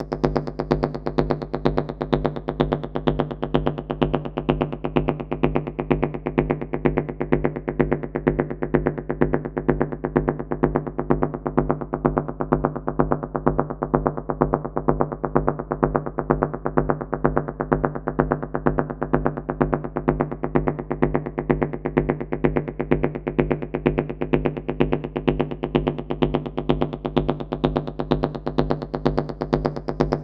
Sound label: ARP 127BPM